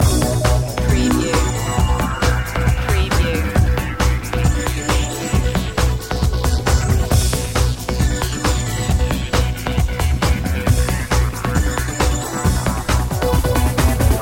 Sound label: electricity loop